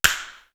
FIRECKR; East West; Global Sounds; D4 FXS

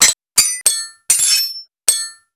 SWHIT, SWORDHIT; Total Control - Action Cutz

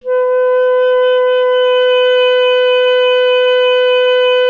clarinet B; Miroslav Vitous; Solo Instruments VOL-1

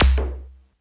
Bass Drum Metal Delay; Lost Tapes From Mars

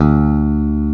E BASS PH D1, Pick Bass

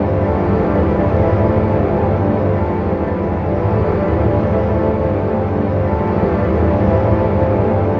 Drone Ambient; Drums & Drones

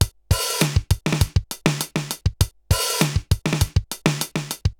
RX17 (100BPM), cyborg beats; musicradar

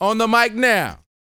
VOX, R&B Dance, HIP-HOP

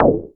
ZAP, MACHINE PERC; Zero-G Ambient 1

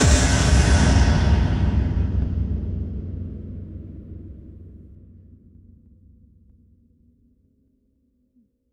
FX Kicks

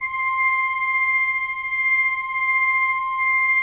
C6, Cathedral Organ; ILIO; Propeller Islands

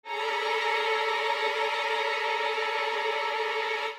Strings Trill, cinematic drama Soundbeds; musicradar

